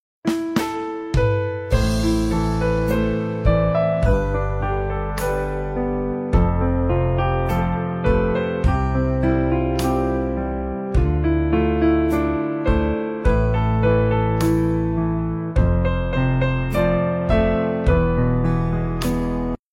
không lời piano version

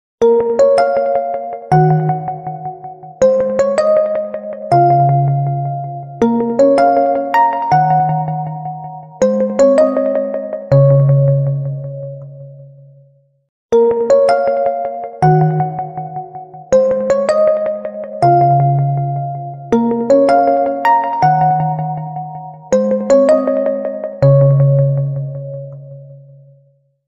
khong loi oppo k11 official

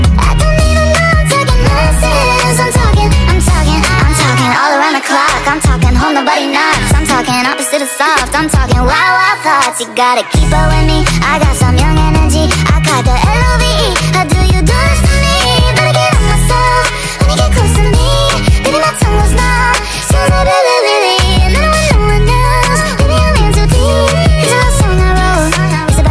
Nhac Chuong Sped Up

